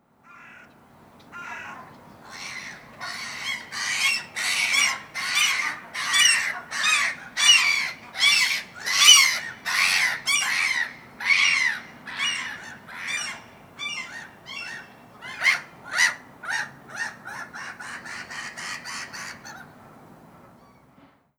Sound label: Rudagalvis Kiras Black-headed Gull - Chroicocephalus ridibundus